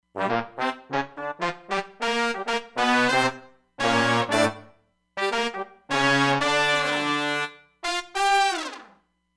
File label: TpTb Sect, Roland Fantom XR